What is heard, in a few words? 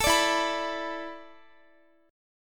E 7th Suspended 4th, Guitar; E7sus4; strummed; x,x,19,19,16,18